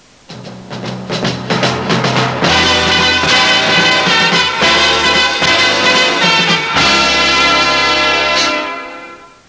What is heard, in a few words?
dramatic